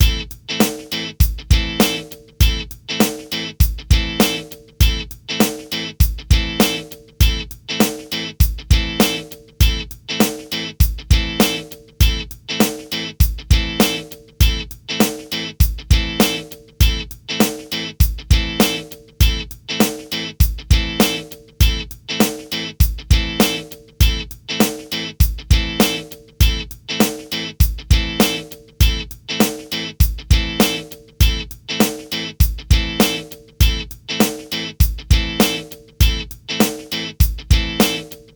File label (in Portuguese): Funk la menor